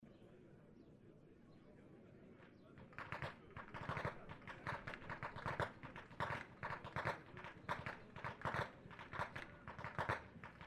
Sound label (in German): schnalzen, jugend; waging